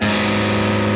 Arp. G#m7 Arpeggio down

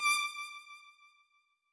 violin

